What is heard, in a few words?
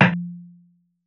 Hats, Alesis Performance-Pad